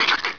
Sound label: cricket splat; gearbox